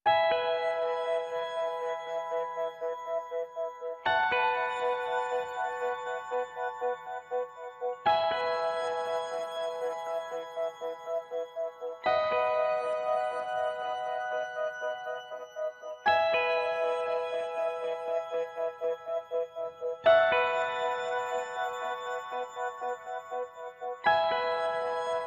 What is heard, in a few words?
Piano and FX Only